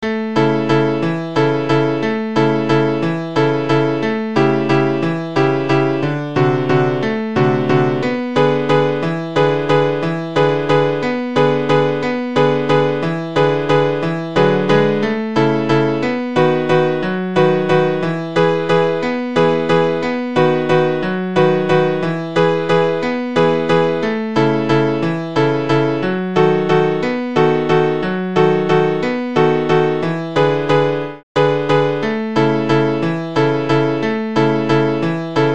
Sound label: cheek accompaniment only